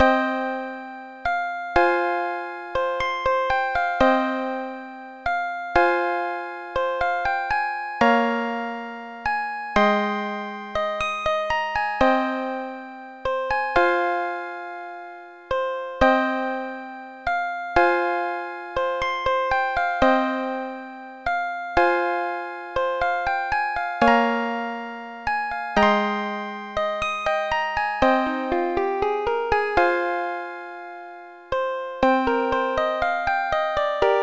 el piano piece; Music-X

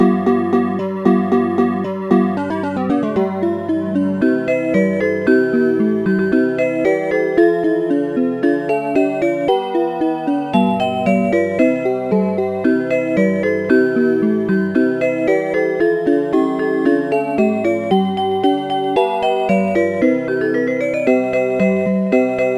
jingle bells; Sonix Music Driver

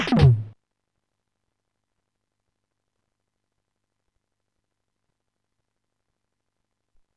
joke rimshot; Instant Music

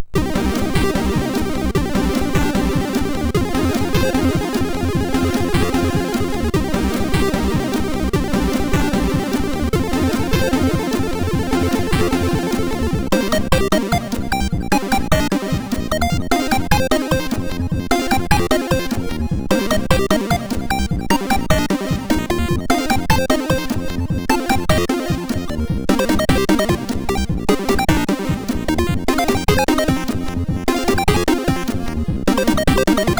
Robot Jet Action, MOS6581R4

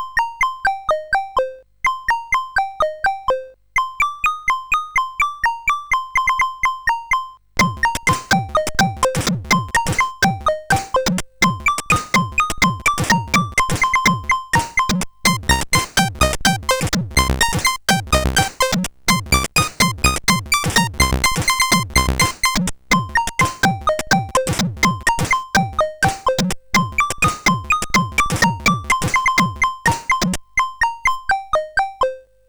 Confused, SID CSG8580R5

